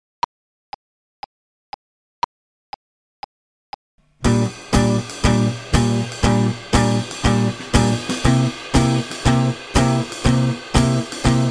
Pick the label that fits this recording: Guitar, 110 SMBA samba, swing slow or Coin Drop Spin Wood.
swing slow